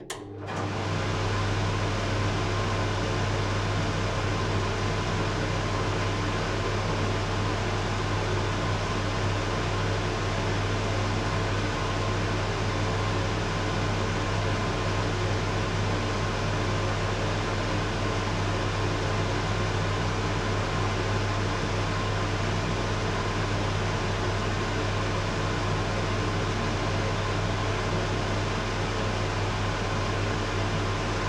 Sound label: Extractor Fan Kitchen; Extractor Fans